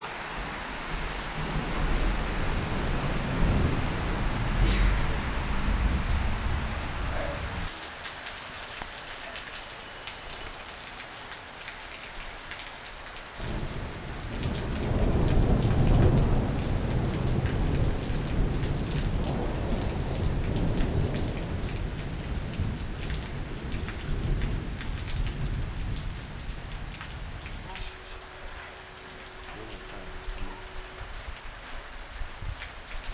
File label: rain and thunder; lightening storm; The storm lasted hours with bolts of lightening streaking through the sky every other second; nepal; It rained daily and one day we caught the most spectacular lightening storm I’ve ever seen; the most colorful light I’ve ever seen